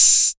dark open hi hat sound a key, Royality free open hat sound tuned to the A note.